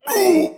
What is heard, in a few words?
death; Paladins; Lazarus